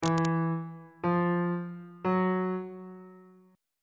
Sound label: c 4th interval pitches on bass clef